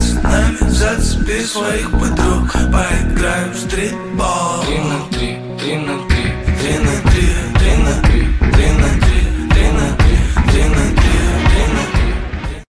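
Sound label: latest Russian ringtone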